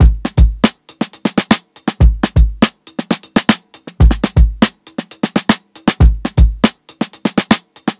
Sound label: 120 16TH BEAT, DRUMS; BASEMENT DRUMS VOL 2